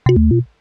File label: future error, computer sounds